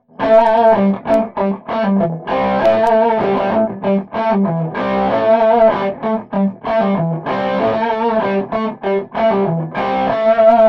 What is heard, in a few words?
settings minus Bass Treble Booster; Rio Grande; Rothwell Treble Booster